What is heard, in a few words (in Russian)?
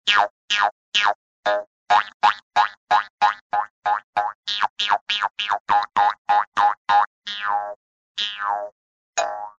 pryjki multyashnye